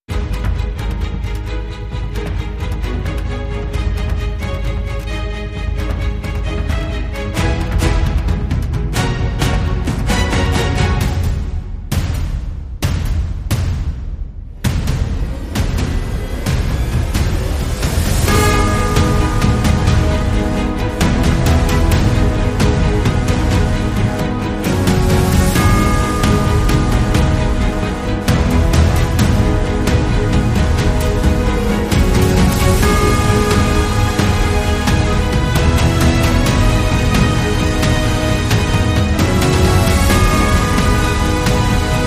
No Choir

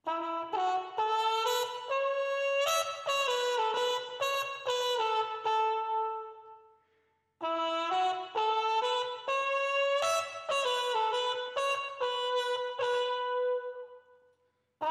Trumpets

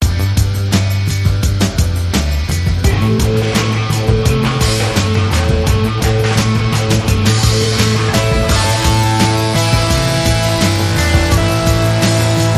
Guitar

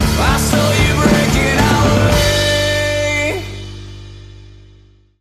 Bumper

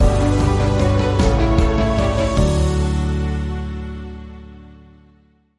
Bumper

